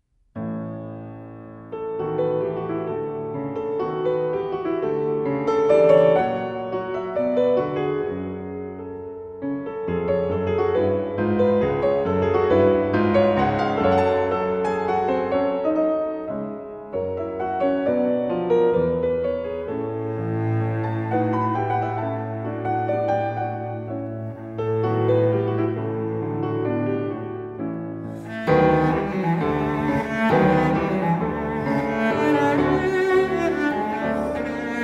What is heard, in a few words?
cello son; reinecke